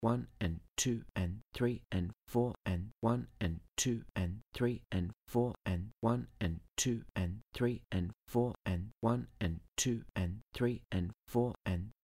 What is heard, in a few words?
8th rythm All, guitar